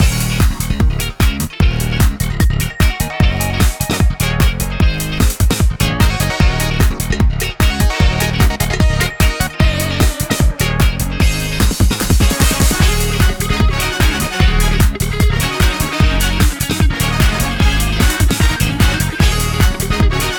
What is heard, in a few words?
City Speedway Loop; boom karts multiplayer kart racing android